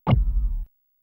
Electronic Gulps Low, servos

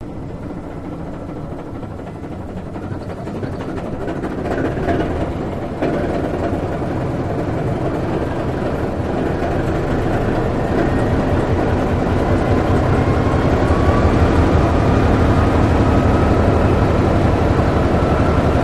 Locomotive Exterior Rev Up, Down

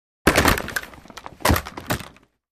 Football Helmets

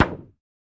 Target Hit Light Pap, target range

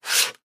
sandpaper stroke

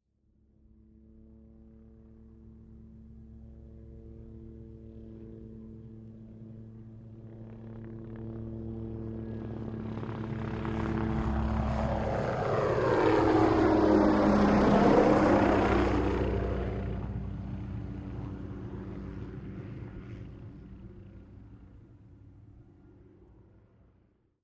OH6 Loach By Medium, little bird

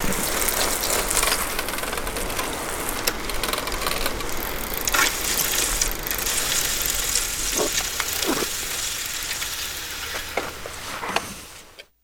Bicycle Stp Idl Off

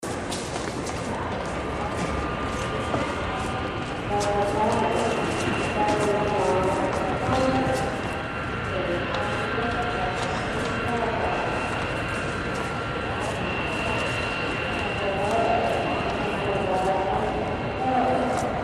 Airport Lobby

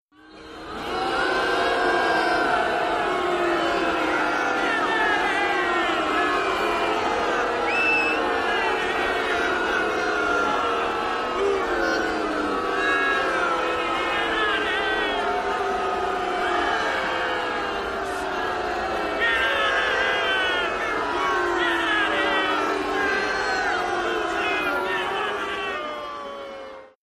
Football Crowd Stea, sports arena football

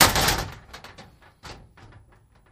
Cabinet Metal Ems Ga

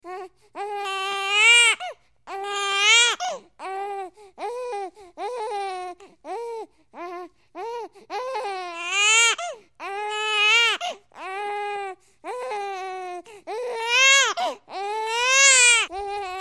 Baby Whines Cries Bre

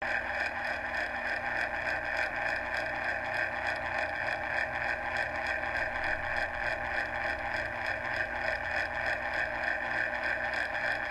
Clock Electric Old

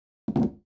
Free Weights Exerci

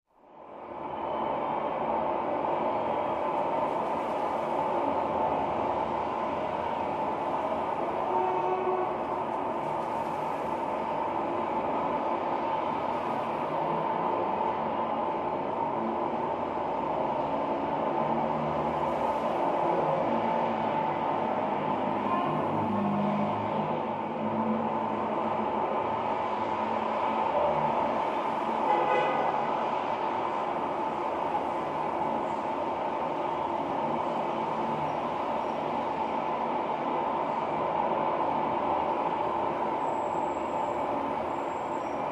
Traffic City Noise

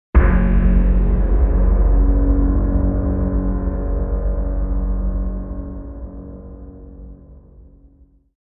Impact Elect Shockwa

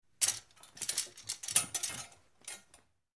Metal Coat Hangers

